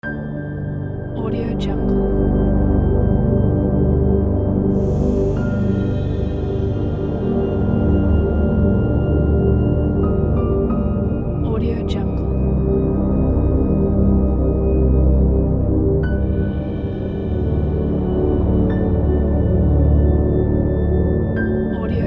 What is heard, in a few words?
space exploration loop